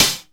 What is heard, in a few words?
Clhh, Jomox Xbase09